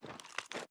sks reload empty raise; Tacz1.1.5+; RSWS 1903

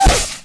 kill; Tacz1.1.5+; RSWS 1903

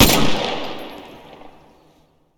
g2 shoot; Tacz1.1.5+; RSWS 1903

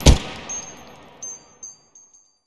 xo16 shoot; Tacz1.1.5+; RSWS 1903

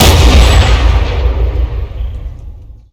sks shoot; Tacz1.1.5+; RSWS 1903

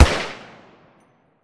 uzi shoot 3p; Tacz1.1.5+; RSWS 1903

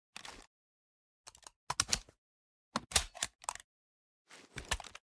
uzi inspect; Tacz1.1.5+; RSWS 1903

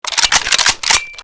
ak47 inspect empty chamber; Tacz1.1.5+; RSWS 1903

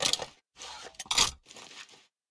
dmr reload; Tacz1.1.5+; RSWS 1903

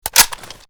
ak47 inspect release; Tacz1.1.5+; RSWS 1903

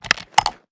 sks reload empty rotate; Tacz1.1.5+; RSWS 1903

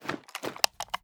sks reload raise; Tacz1.1.5+; RSWS 1903

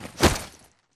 melee stock; Tacz1.1.5+; RSWS 1903

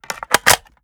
sks reload mag in; xmag; Tacz1.1.5+; RSWS 1903

